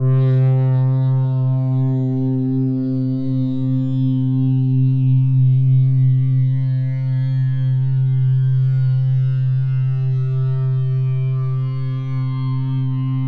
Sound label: Atmos Wavetable Like, Synth; Loops; Tonal; Ableton Live 12 Lite; Core Library